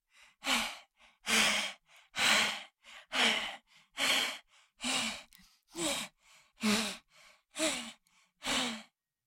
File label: Cartoon little child voice breathing